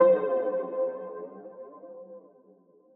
PLUCK (C), Pedal One Shots; Dijon; Cymatics; ANALOG EVOLUTION Beta

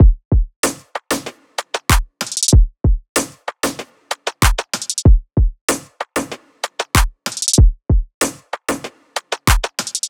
Full Drum Loop 95 BPM; Drums; Humble Hip Hop Sample Pack; Ingredient

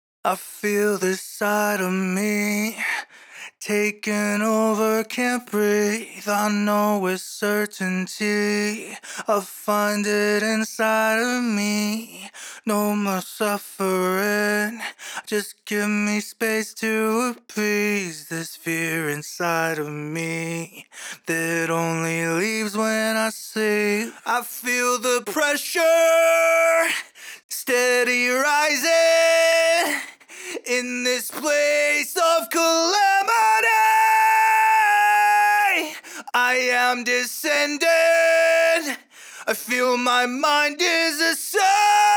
Heavy Vocal Main Dry, Acapellas, F#min 160bpm; Heavy Vocals 4

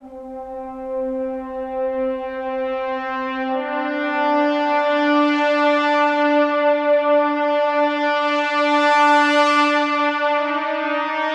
Soft Brass Swell - Cm 70Bpm; Construction Kits; STEMS; Ghosthack; SkyRealm; Kit 19 - Ode To Daenerys

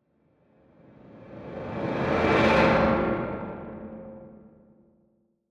Riser

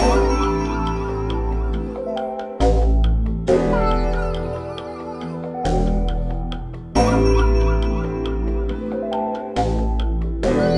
138 BPM E min, RnB; Becoming Dust; Cymatics; Destiny Premium Melodies (BETA)

